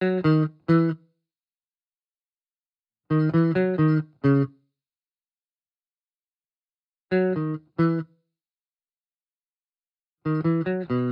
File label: Guitar Bpm135 Key B, 70s Guitars; Fox Samples - Back to the 70s Guitars